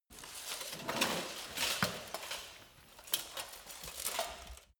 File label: light get up